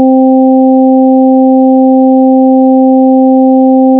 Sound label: tone sin x+2x+3x